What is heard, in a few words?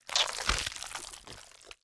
gather meat